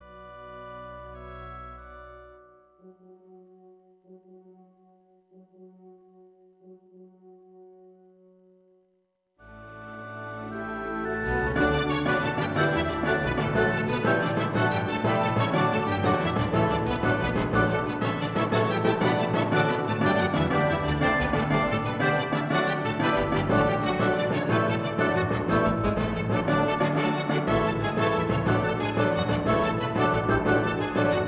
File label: karaoke